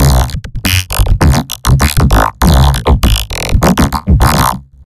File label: Beat; Mass Effect 2; Legion